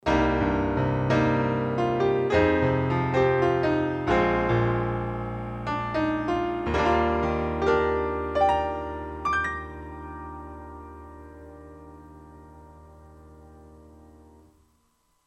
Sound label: UA 476p boesendorf 290 close gtr